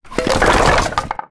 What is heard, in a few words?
objects, World of Tanks